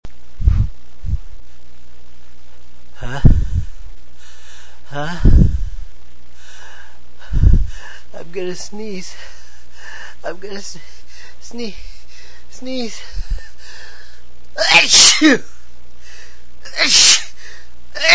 Male Sneeze

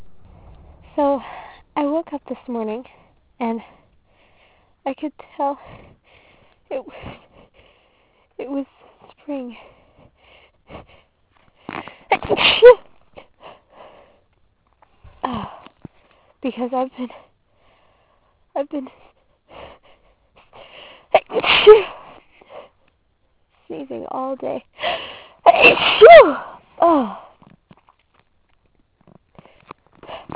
allergies, female

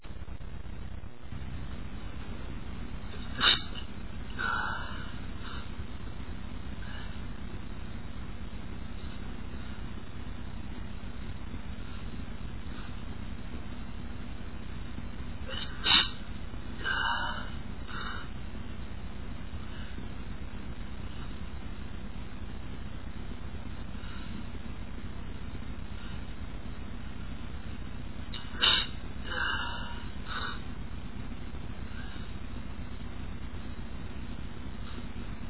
stifle, male, achoo

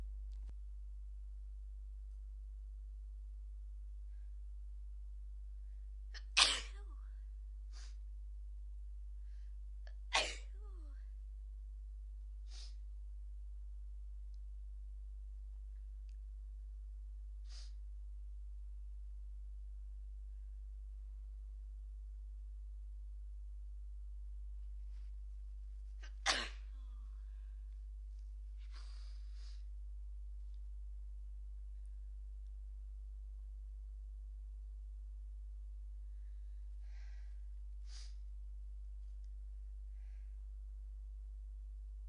more sneezing from cold, female

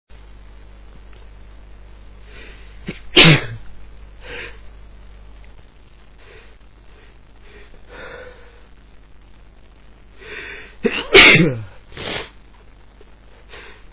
cold, male

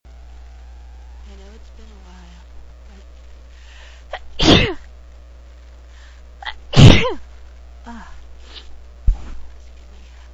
allergies, female

